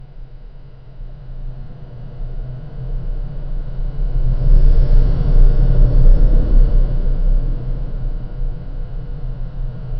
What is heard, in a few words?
wind whispers, haunted house